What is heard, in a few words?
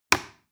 plastic button little push heavy